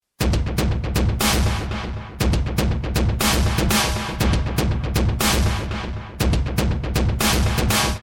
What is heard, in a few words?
Rhythmic Delay